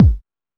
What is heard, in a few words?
Techno, EMU XL-7